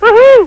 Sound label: Whoo Hoo